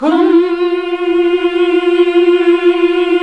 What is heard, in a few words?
Nuns Hmmhh; Sound & Vision - Gigapack II